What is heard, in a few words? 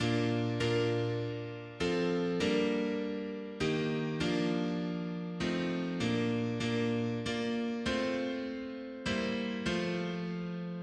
mono piano, hymns; C est ton amour